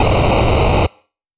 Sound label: Noise; 8-Bit Kit Volume1